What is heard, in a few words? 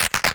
Glitches; Antidote Advent